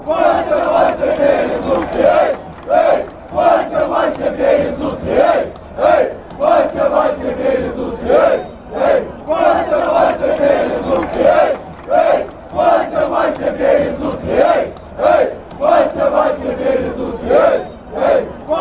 cska chant

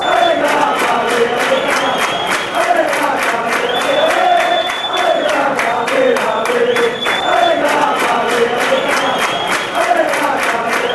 ale, fan chants

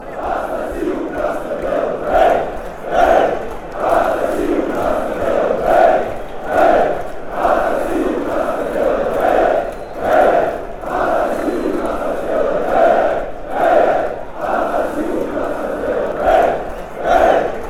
hey hey fan chants

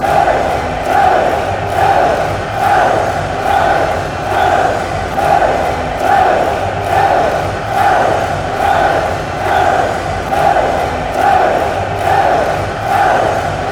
hey hey fan chants

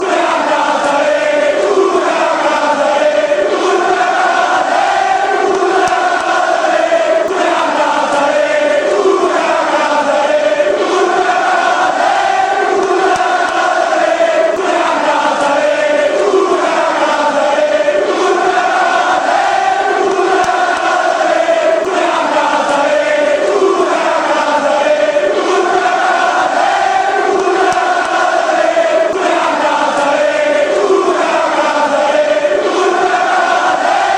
chant, fanchants; paraguay

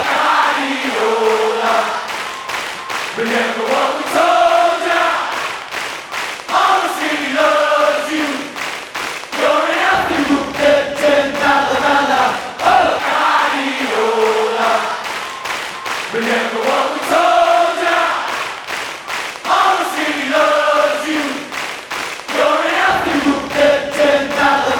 fanchants; guardiola

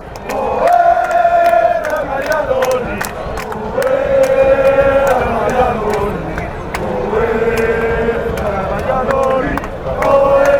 ole, fanchants

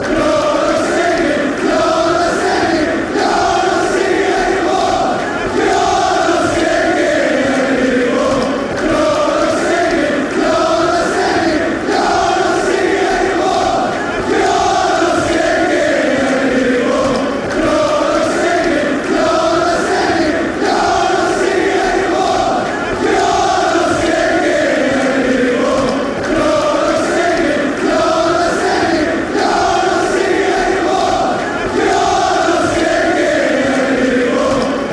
youre not singing; everton

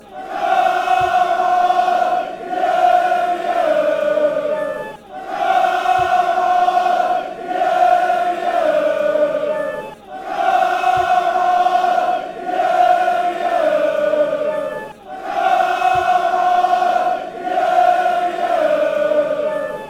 come you yellows; cufc

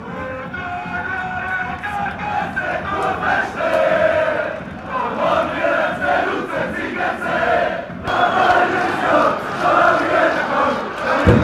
woah oh oh

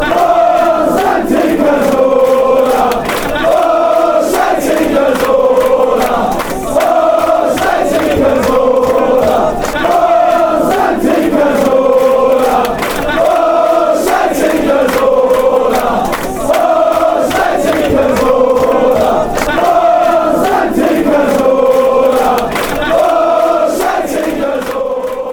ohh, chants; santi cazorla

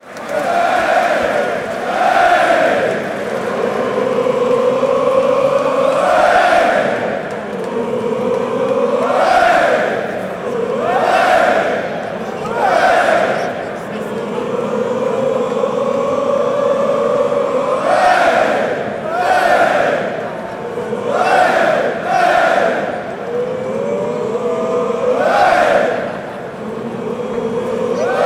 fan chants

